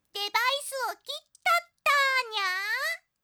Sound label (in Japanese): デバイスの切断